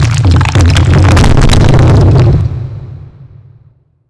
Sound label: stone fall; cstrike; kzsca heaven2